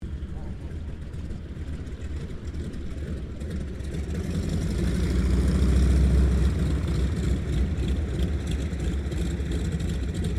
AIRCRAFT BOEING PT-17 STEARMAN IDLE SHUTDOWN, Trainer; 1939; Airplanes; Vehicles